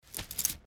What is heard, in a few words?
Reading bow